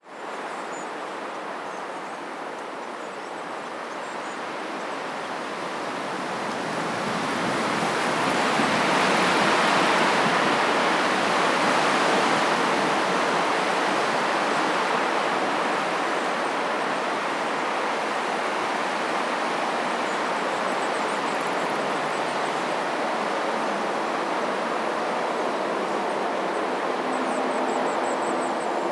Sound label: Noise Sculpt; Pure Data